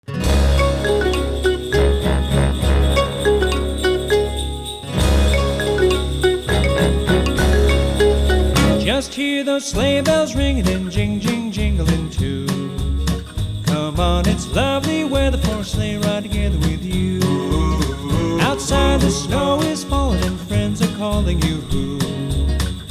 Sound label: sleighride